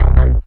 BLURP LOW, Hard & Loud Techno; USB Soundscan vol.01; AKAI

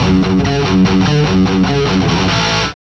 GTR; Roland - LA Composer vol. 2

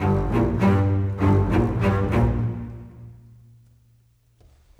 Bass Cello, Orchestral; Sonic Foundry (Sony Creative Software); Orchestral VOL-2; Modern 32

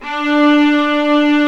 STR, Vlas Sect, Agitato

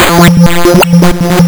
lsdj waves; wavetables

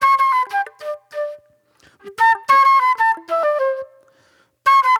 Flute

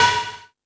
Tool Kit, Drum Machines E-MU Pro-Cussion

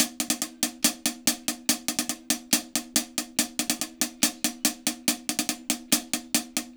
HAT, HI HAT LOOPS, Dub; Drumdrops In Dub VOL-1

